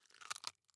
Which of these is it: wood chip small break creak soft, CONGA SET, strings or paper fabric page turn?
wood chip small break creak soft